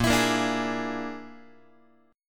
Bb 7th Flat 5th, down, Guitar; x,6,7,8,6,7; 4A,4E,5A,5D,5Gsharp,6Csharp; Bb7b5 strummed; JGuitar

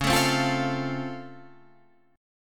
Db Augmented Major 7th, down, Guitar, Drop D

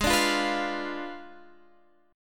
Ab Diminished 7th Ab, Guitar, Standard; Abdim7 strummed; JGuitar

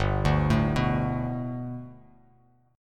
G Major Add 9th, Bass Standard; Gadd9 arpeggiated; JGuitar

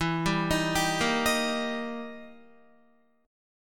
E Minor Major 7th Flat 5th E down Guitar; EmM7b5; arpeggiated

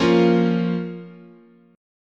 F Suspended 4th Sharp 5th

